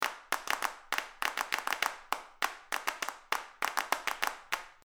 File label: Clap; Diwali